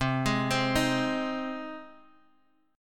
C Minor Sharp 5th, Guitar, Drop D; down; chord shape

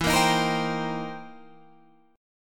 C Minor 7th E; strummed

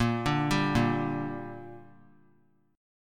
A sharp 6th; arpeggiated; JGuitar

